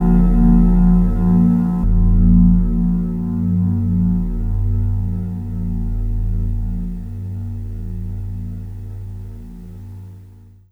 Tundra, Pads; Sonic Foundry (Sony Creative Software); Synchro Funk

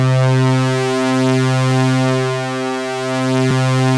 OB C4 -L, Rare Synthesizer; Hollywood Edge - Giorgio Moroder Rare Synthesizer Collection; OB 4 VOX.

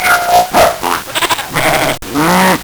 Nintendo Gameboy LSDJ Animals

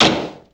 Car trouble, Drum&Bass Hits & Bits; Total Drum&Bass; 90 s Sample CDs

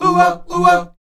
O-WA F 3, Afro Chants, Oh-Wa Oh-Wa; Roland LCDP11 Africa VOL-1

